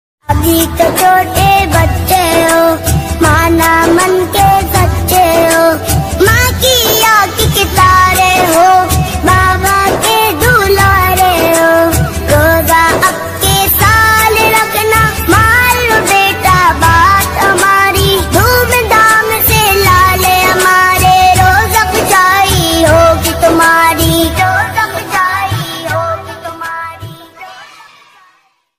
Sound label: naat ringtone